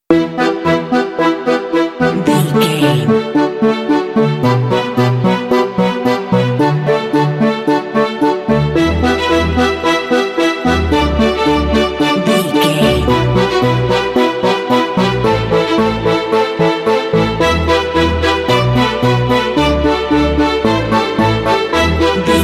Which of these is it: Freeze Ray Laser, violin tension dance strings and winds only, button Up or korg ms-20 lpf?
violin tension dance strings and winds only